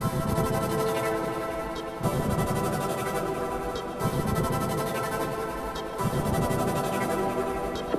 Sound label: Synth loop (120BPM); cinematic drama; Loops; musicradar